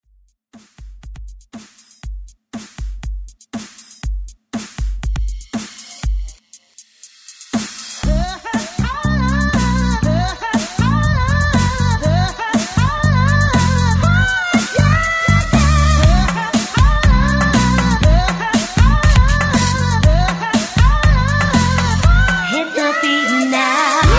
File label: ReDrum 120 BPM